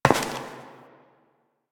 Step Metal; Warframe; Koumei

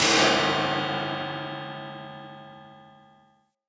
Light Mirror Hit Negative E; Puzzles

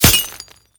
Helmet Impact; Grn Chem Nox

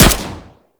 bow Shot; Warframe; Weapons; Tenno; CYXbow

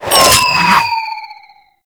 Grenade Proj By; Warframe; Lasrian Soldiers; Weapons; Lasrian Goonadier Grenade

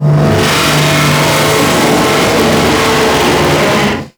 Sentient Vessel Movement Limb, Enemies; Warframe; Tau

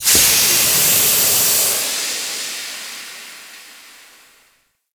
Ship Decontamination Steam Burst; Gameplay; Ambience; Warframe; Corpus Ship Remaster

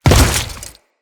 Step Goo, Footsteps; Enemies; Grineer

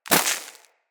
Bullet Impact Debris Snow; Weapons

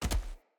Battle Dog Land Veg, Footsteps; Snc Battle Dog; Foley

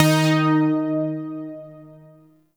Shawzin Oct Two D Sharp; Music; Warframe; Zariman

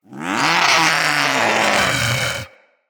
Vulpaphyla Vocal Death, Hunting Critters; Characters

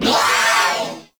Purgatory Warrior Death; Warframe

